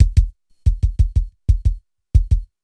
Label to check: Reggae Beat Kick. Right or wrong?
Right